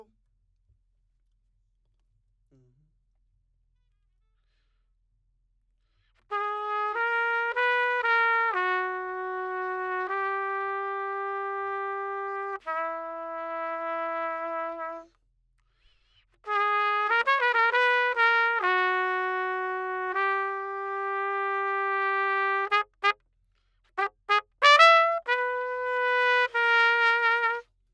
Trumpet

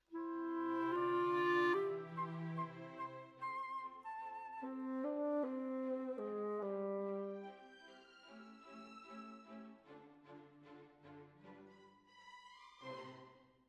Clarinet

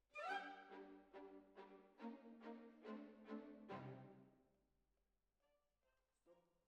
Oboe

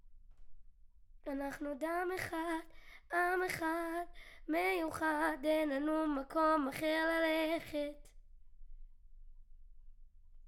Children Choir; Studio Bekol Children Choir

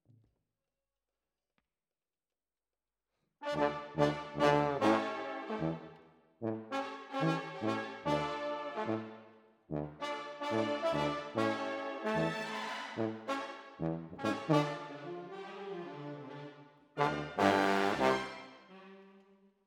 Tromb ASS

